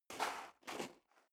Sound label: step high heels gravel light scuff; schritte; Footsteps; menschen